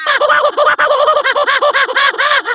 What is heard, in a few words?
eeee ooo